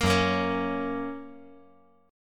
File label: Gb Major